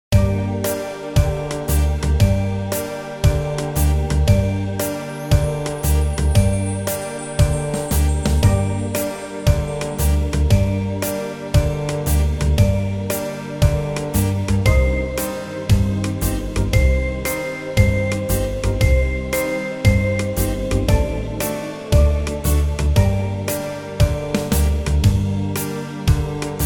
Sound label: brass minus